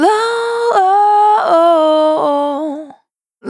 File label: Spin Dry, Single Voice Melodic Loops